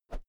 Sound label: whoosh thick; swooshes-swishes